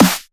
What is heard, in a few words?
Marker